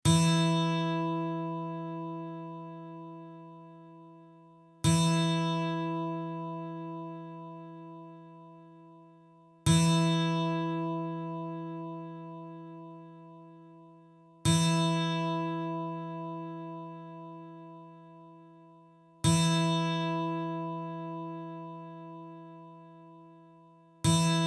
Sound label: Tuning E Guitar Sound